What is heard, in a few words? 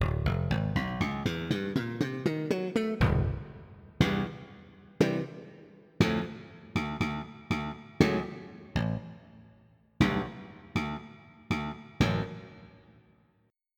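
Funk Pop, bass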